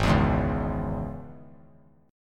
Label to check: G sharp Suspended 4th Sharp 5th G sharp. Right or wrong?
Right